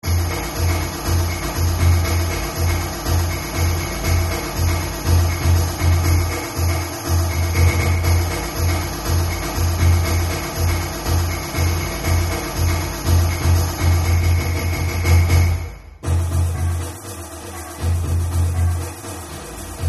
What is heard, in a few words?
drum party